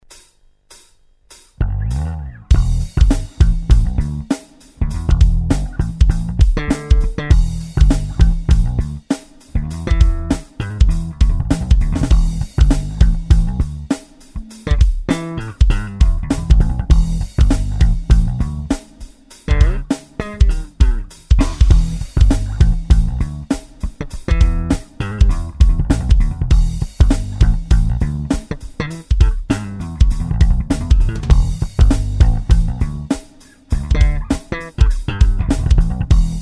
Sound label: funky